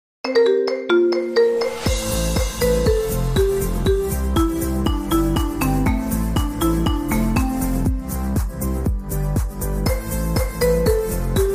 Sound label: Marimba; CSGo